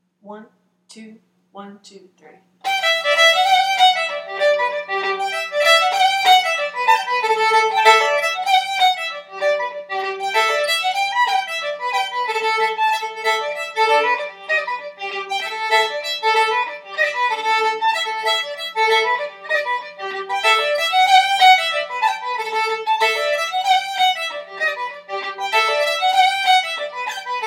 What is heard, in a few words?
Fiddle At Tempo; Muilean Dubh